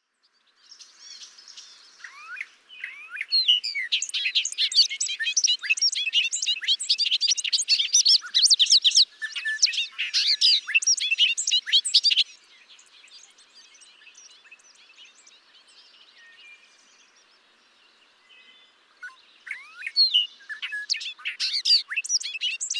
Bobolink

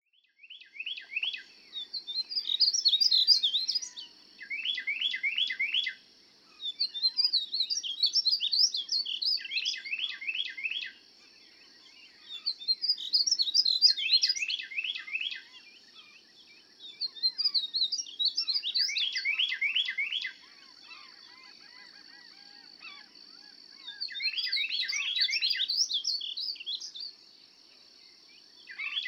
Painted Bunting

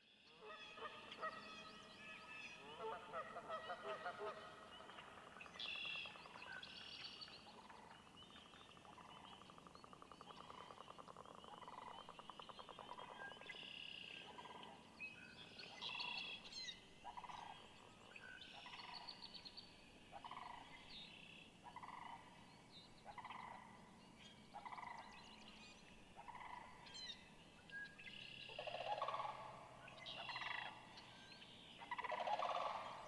Sandhill Crane

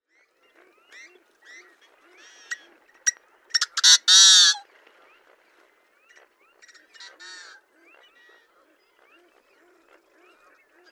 Yellow headed Blackbird

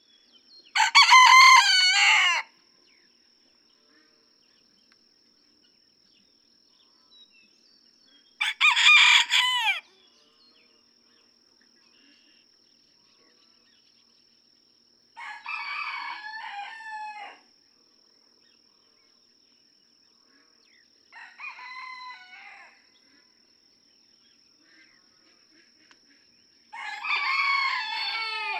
Red Junglefowl; Just outside Mammoth Cave National Park, Kentucky; Three crows from each of three roosters; chicken; barnyard; crow alike in this Kentucky barnyard. June 2, 2010.

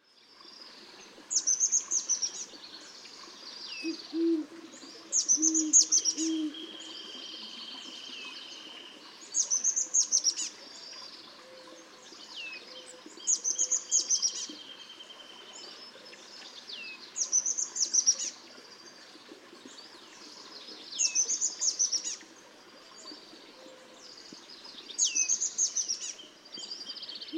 Chestnut-backed Chickadee

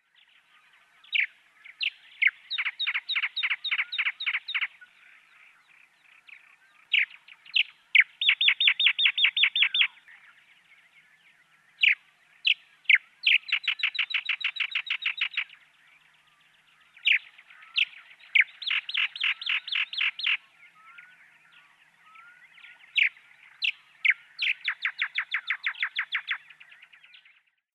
Sedge Wren

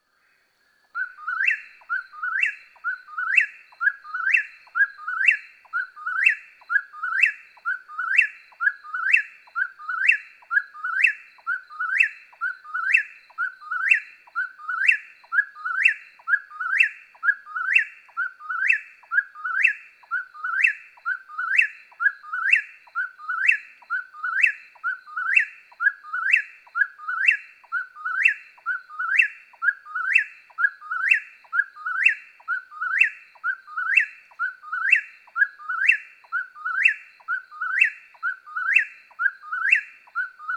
Eastern Whip-poor-will; May 30, 2010. Cane Creek Wildlife Management Area, Daniel Boone National Forest, London, Kentucky.

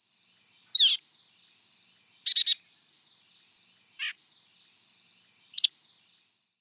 White-eyed Vireo

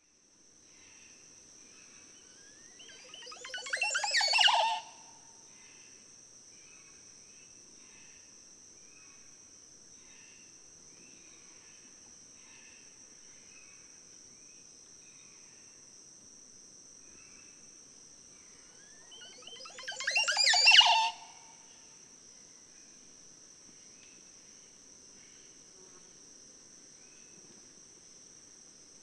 Montezuma Oropendola

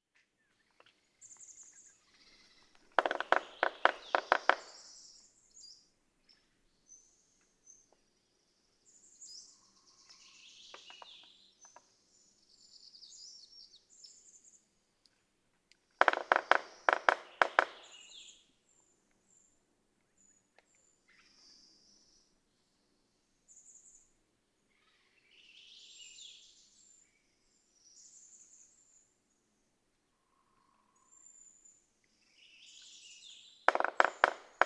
Red-breasted Sapsucker

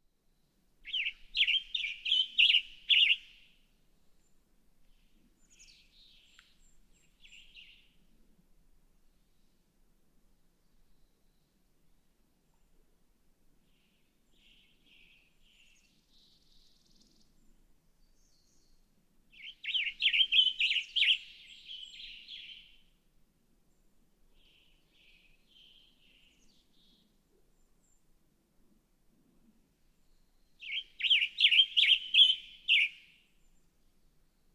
Western Tanager

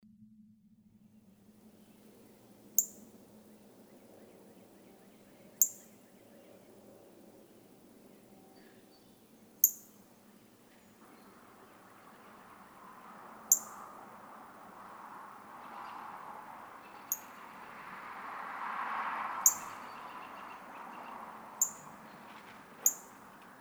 Northern Cardinal; Cardinals awaking

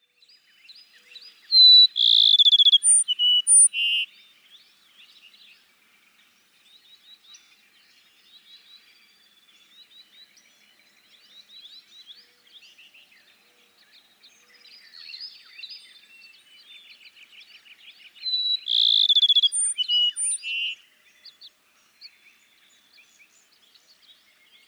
Cassins's Sparrow

